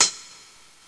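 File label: Cymbal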